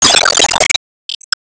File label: Enemy Jet Skill Car Turn